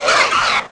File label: Arachnid Die, Aliens